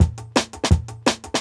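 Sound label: Jungle Breaks, 170 bpm; Dehydrated; Original Jungle Breaks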